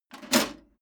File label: metal plate armor movement single